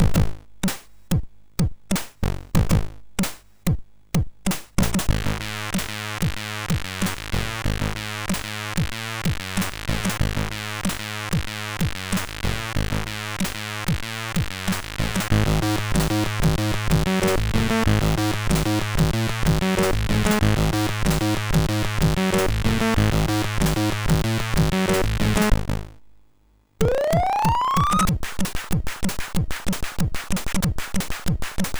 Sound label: White Noise, MOS6581R3